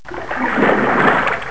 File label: SPLASH